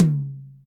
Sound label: high tom one shot c sharp key